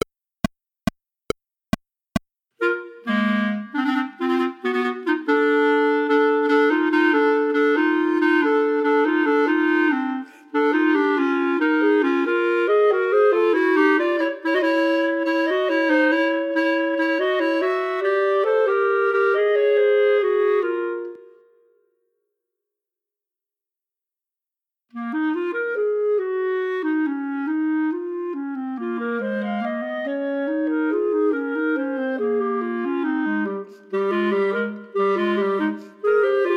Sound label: 3 Pieces for 3 Bb Clarinets minus 3rd part